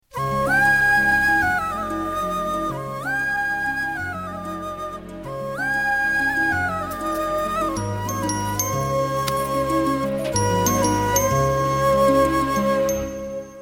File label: Flute Love; Sandakozhi; Tamil